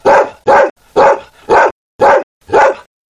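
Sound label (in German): Schäferhund bellt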